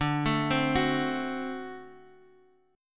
D 7th, down, Guitar, Open G

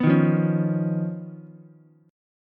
Eb 7th Suspended 2nd Sharp 5th Eb, Ukulele; 4,3,1,4; Eb7sus2#5 strummed; JGuitar

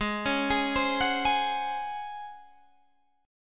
C sharp Major 7th Suspended 4th G sharp

